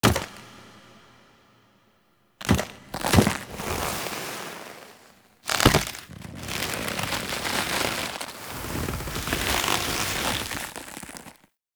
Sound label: Kiss Foley; Ballas; Warframe; The New War; Throne Room